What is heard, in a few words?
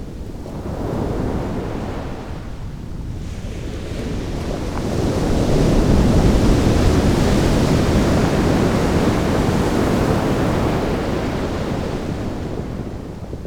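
Malibu waves, ocean & brook; SFX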